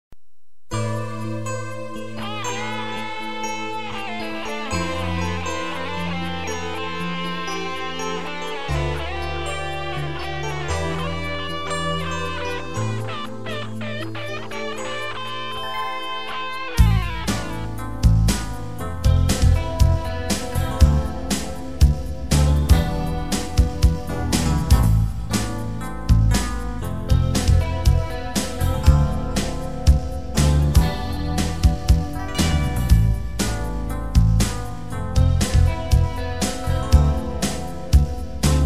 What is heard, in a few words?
guitar version